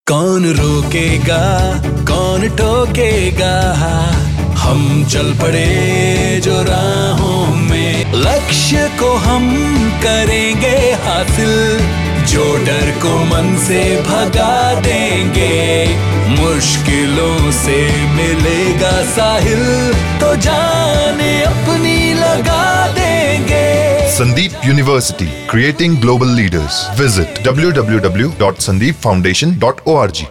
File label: Jingle